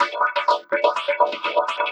Multifunk, Funk Keys; Sonic Foundry (Sony Creative Software); Synchro Funk

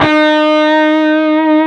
PRS PWR E4E, Guitars; PRS PWR CUTS

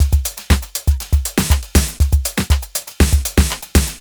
120 Busy, Nu Jazz House Beats; PowerFX

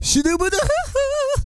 SING, Vocal Planet R&B & Dance; ILIO

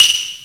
Title Screen loop; Ganbare Goemon 2 - Kiteretsu Shogun Magginesu!

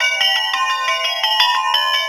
SPIN BELL LOOP; Rarefaction; Poke in The Ear With A Sharp Stick

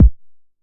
Bass Kick, Jomox Airbase99

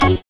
SYN, dance; Sound & Vision - Gigapack II

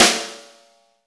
snare, Roland; Club-50 - Foundations Roland; Ext.Snare 4

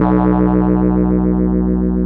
PIANET; Sonic Reality; Vintage Timetraveler; CLAV PIANET

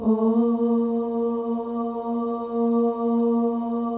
OH, FEM, Symphony of Voices; Spectrasonics; FEM.1 OHS

